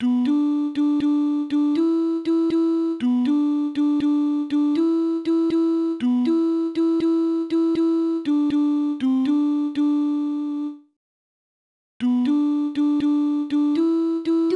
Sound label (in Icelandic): sopran; Godir vinir